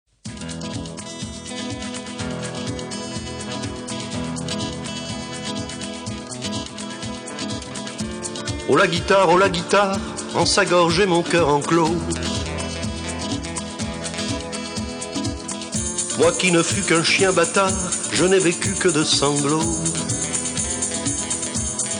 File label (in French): Oh la guitare